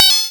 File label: pickup star; SFX; WhaleAdventure VR